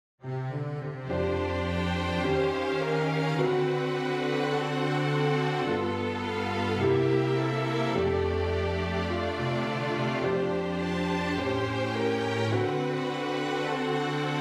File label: Foxtrot